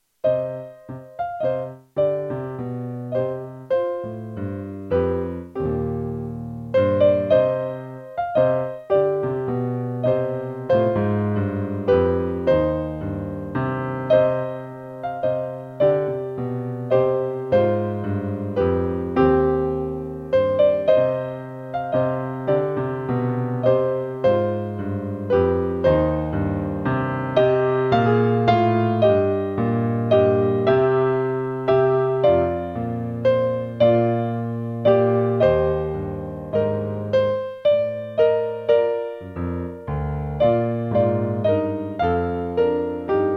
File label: Menuet Handel Accompaniment; Menuet From Almara Handel